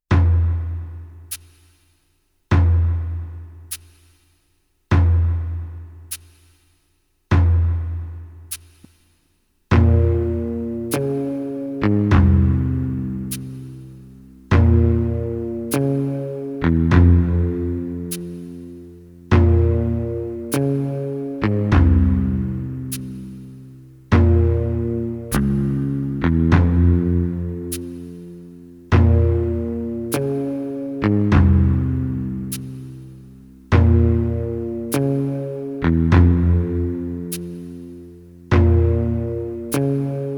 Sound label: Rainforest groove, Minimal ethnic; Advertising music